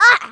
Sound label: BOB GRUNT; Messiah